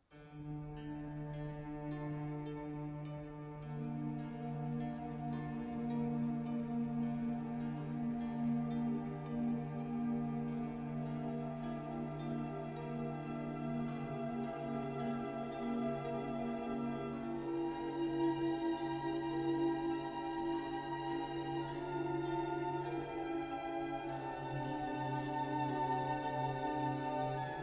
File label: Violin Concerto in F Major, Adagio molto; Op. 8 Violin Concerto No. 3 in F Major, RV 293, L'Autunno. II. Adagio molto